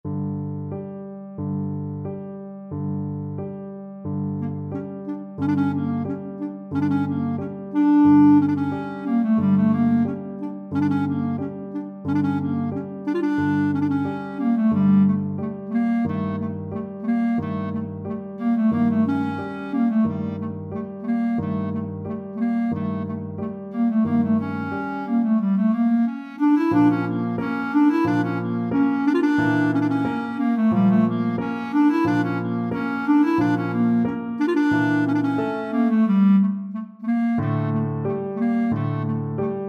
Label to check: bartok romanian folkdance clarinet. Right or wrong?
Right